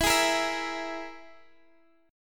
E Major Flat 5th, Guitar, Half Step Down

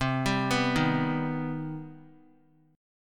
C Minor Major 7th C 3C,3F,3A,3D; arpeggiated; JGuitar

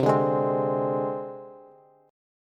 C Suspended 2nd Sharp 5th C Bass; strummed; 20,17,18,17; JGuitar

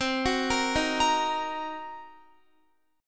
C Minor 7th Flat 5th Guitar, chord; Cm7b5; arpeggiated

